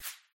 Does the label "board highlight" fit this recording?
Yes